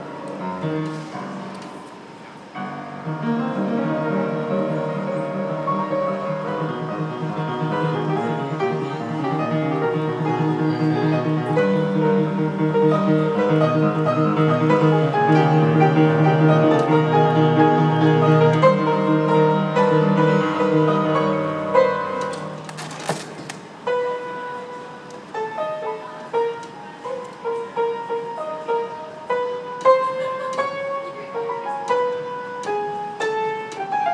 playing the moonlight sonata on the piano at st pancras